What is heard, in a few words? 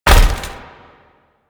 Necramech Melee Jump; Enemies; Warframe